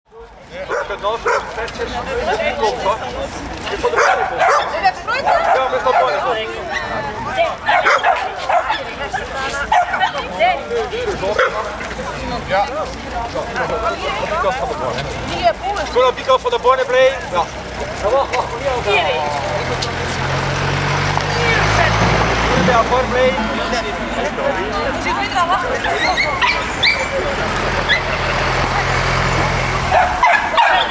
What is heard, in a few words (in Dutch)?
sfeer Ingooigem